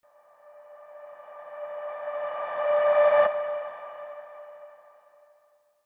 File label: Reverse Synth D